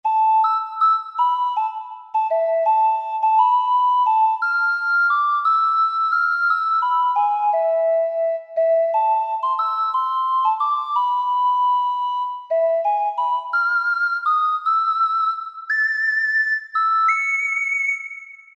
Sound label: Ocarina; Roland SR-JV80-05